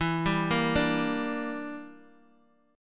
E Minor 7th Flat 5th E, Guitar Open D

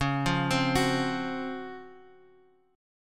C sharp Major 11th C sharp

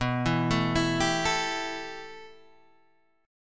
B 7th Suspended 4th Sharp 5th, Guitar, Open D

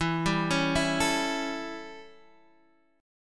A 7th E, chord

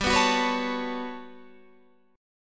G Major Sharp 11th G, Guitar Standard; strummed; JGuitar

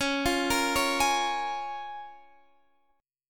C sharp 6th C sharp down Guitar DADGAD

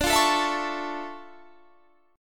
D Minor Major 9th, D, down, Guitar Standard; DmM9 strummed

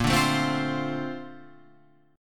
A sharp Major 9th

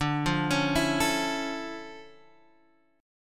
D Minor Major 9th D, guitar; DmM9 arpeggiated; x,x,x,7,5,8,6,6

